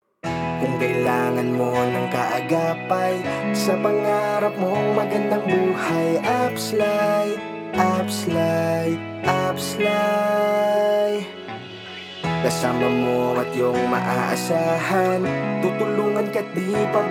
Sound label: Jingle; AFPSLAI